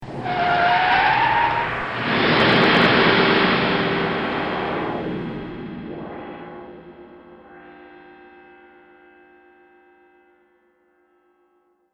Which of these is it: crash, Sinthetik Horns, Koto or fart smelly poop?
crash